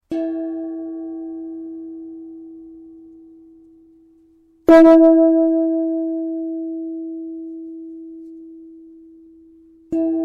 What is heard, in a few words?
gong black